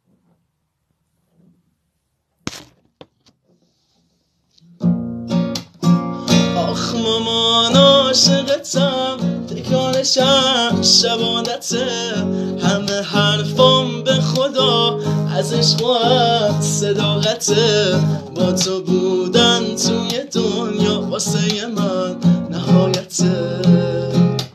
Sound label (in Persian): Ba Gitar